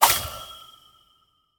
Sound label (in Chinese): 试炼刷怪笼生成物品声